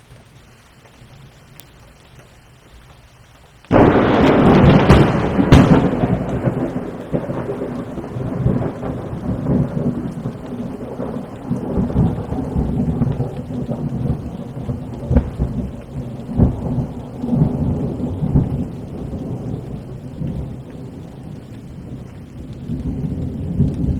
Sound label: thunder claps